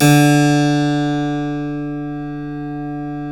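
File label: D2 clavinet left, Keyboards Acoustic; USB Soundscan vol.17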